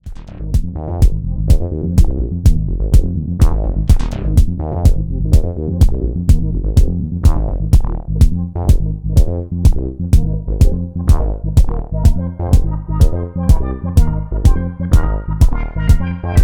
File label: Single MIDI Out Arr; Future Retro Zillion